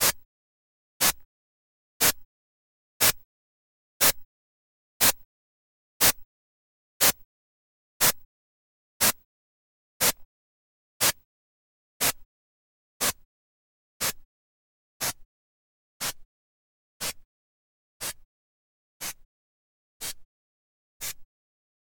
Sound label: ORTF, noiseburst